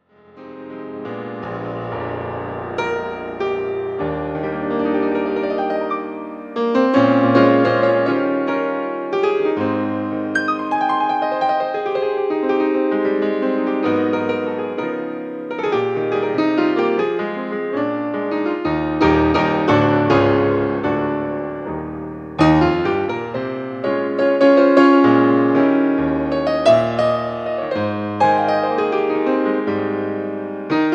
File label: Misty Piano